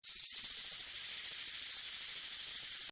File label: Servo Mechanical SciFi Robot Toy; Scifi Servo F Mechanical Robot Small Motor Toy; Robot Sound Effects; BBFX